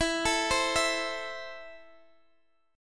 E Suspended 4th Sharp 5th, Guitar DADGAD; x,19,19,17,19,x; down

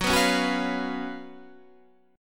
F sharp Major 7th Suspended 4th, Guitar Standard; F#M7sus4 strummed

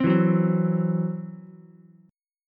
C-Major Flat 5th, Ukulele; strummed

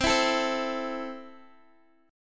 C 6th C down Guitar; strummed

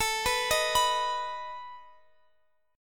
A Suspended 2nd Flat 5th A, guitar

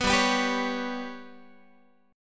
G Major Flat 5th Guitar, chord; GMb5; strummed

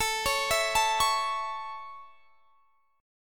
A Major A; arpeggiated; x,24,23,21,22,21

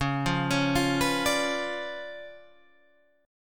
Db 7th Flat 9th Db, down, Guitar Open G

